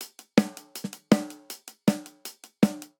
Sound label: Energetic Pop Snare & Hihat 160 bpm; 4 4; MIDI Clips; Ableton; Factory Packs; Drum Booth